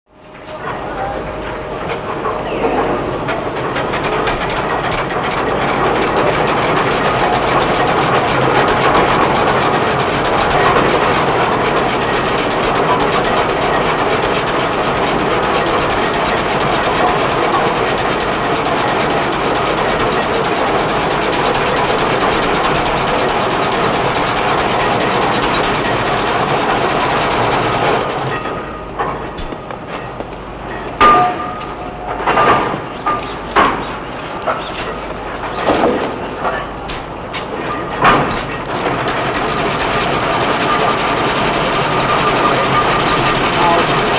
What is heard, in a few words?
Factory Atmos Heavy, factory